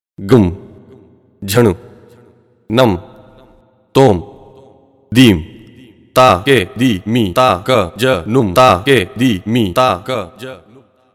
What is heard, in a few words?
konnakol